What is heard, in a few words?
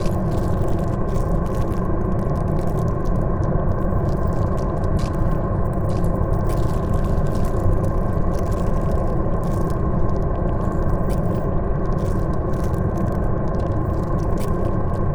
pro ship mauler idle; biogenesis